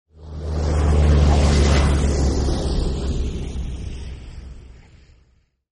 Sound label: map plane